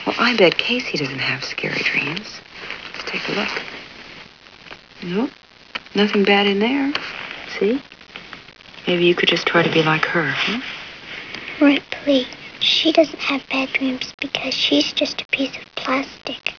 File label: plastic